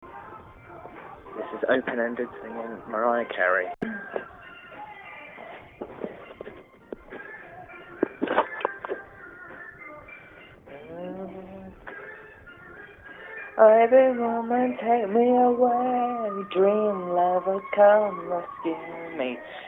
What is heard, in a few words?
me singing